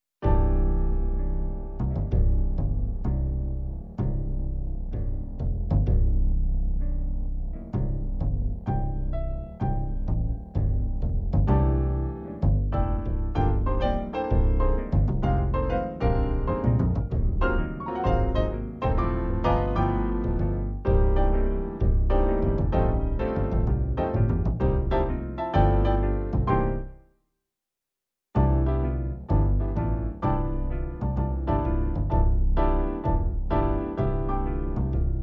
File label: Jazz, midi